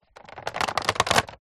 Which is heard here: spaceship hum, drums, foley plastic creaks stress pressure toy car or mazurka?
foley plastic creaks stress pressure toy car